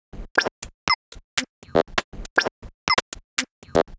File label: Buzzer, Percussion, 120 BPM; Sonic Foundry (Sony Creative Software); Underground UK House & Electro